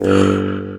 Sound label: MT G, JHARP G B; Xsample VOL-9